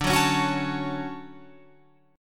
D Major 7th, Guitar, Open F; DM7 strummed

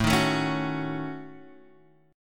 A 6th Flat 5th, Guitar, Standard; A6b5; strummed; JGuitar

